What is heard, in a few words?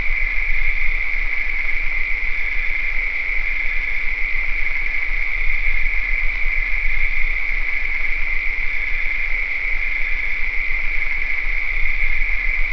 swamp